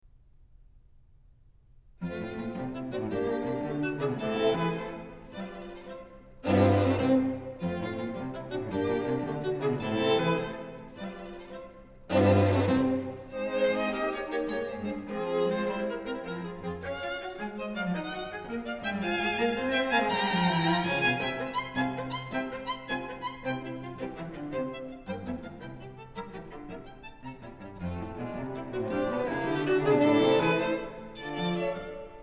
String Quartet No. 1 in F Major, Scherzo (Allegro molto); Ludwig van Beethoven; Beethoven - String Quartets No. 1-6, Op. 18